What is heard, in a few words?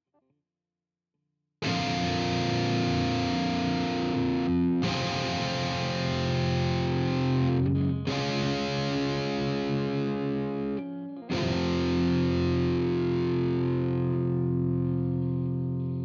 Guitar